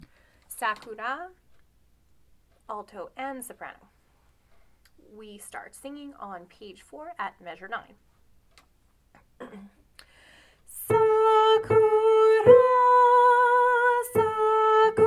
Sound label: sakura soprano alto; choir